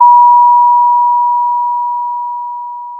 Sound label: Sound of a school bell